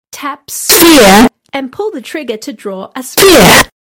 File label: tap sphere